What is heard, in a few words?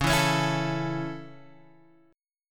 Db Minor Sharp 5th, Guitar, Open G